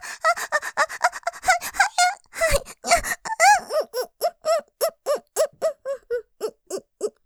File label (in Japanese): 喘ぎ さらにテンポ早く、ピストンの度に喘いじゃう; モノラル; ループで楽しむ！シェフの気まぐれ抜き抜きコース